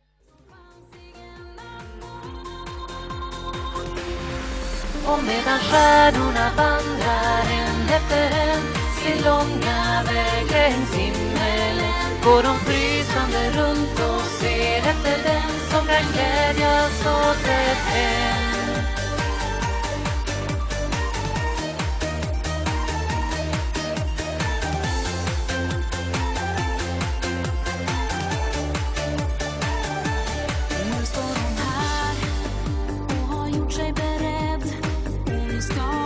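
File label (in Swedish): Medan stjärnorna vandrar tenor; Sarek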